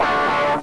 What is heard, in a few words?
GUITAR